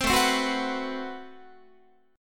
A Minor 6th Add 9th, Guitar; Am6add9; strummed; chordshape; 15,17,15,16,15,17

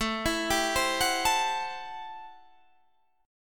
A-Minor 7th Add 6th, chord; Am7 Add6 arpeggiated; JGuitar

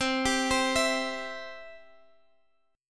C Major, Guitar Half Step Down

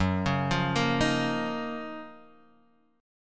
Gb Major 13th, down, Guitar, Drop D

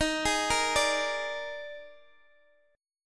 D sharp Major 7th Suspended 4th; D#M7sus4; arpeggiated; x,x,13,13,11,10; chord shape

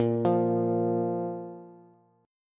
A sharp 5th A sharp, Bass; x,x,8,10; down

